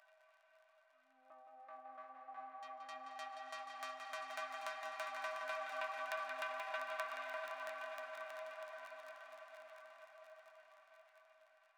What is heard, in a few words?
rain synth; fx; bespoke - Floral v.1